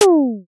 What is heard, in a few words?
comedy cheeky pop